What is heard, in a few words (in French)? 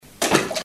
plouf